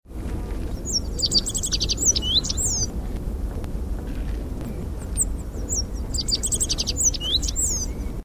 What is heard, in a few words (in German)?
zippammer